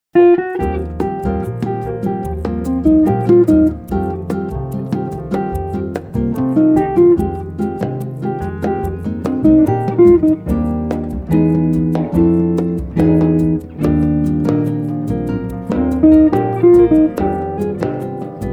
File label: Trio Quartet